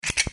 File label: clean